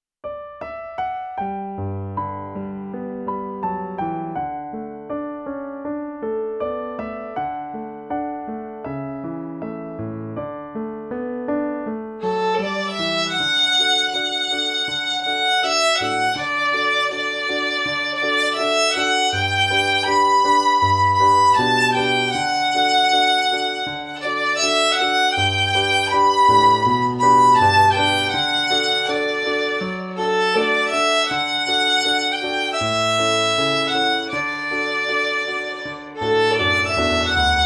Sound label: Beginner Violin Piano 80 BPM